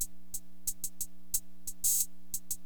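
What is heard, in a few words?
Hi-hat loop, HipHop; LiveAct Pool 1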